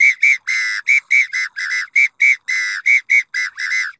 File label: Pito Samba 120 bpm; Best Service; Festa Latina VOL-1